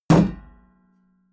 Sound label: shield metal impact dent